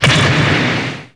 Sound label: SE GAME SLIDE; maimai